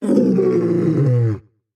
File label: monster roar long; soundscrate